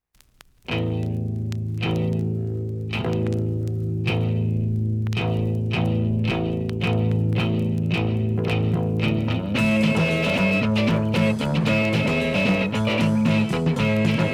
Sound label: Psychedellic Guitars